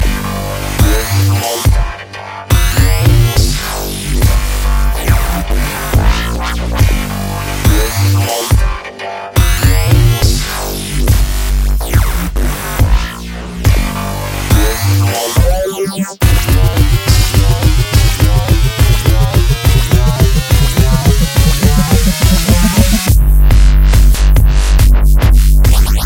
dubstep beat

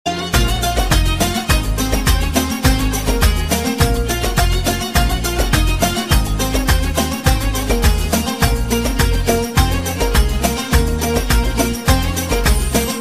arabic belly dance

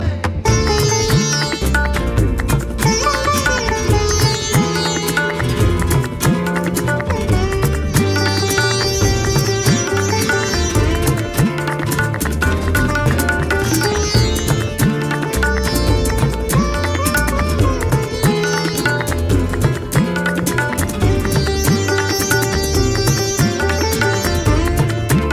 sitar and guitar

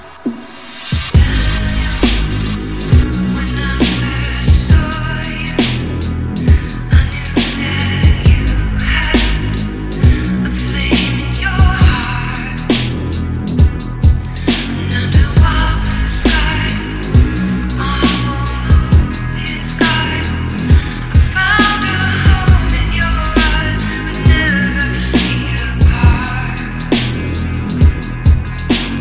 dubstep

